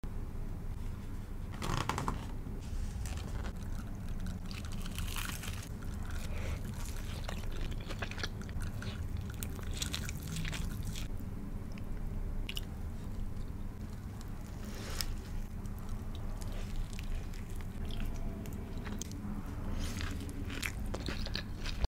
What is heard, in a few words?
fast food, خوردن